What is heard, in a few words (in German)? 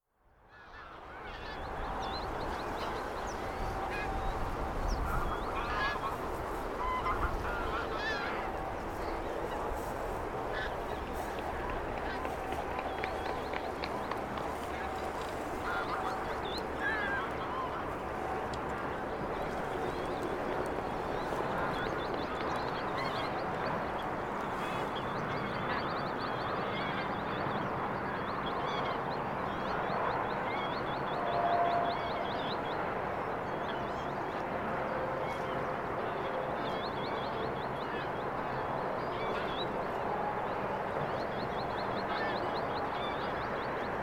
langzehen strandlaeufer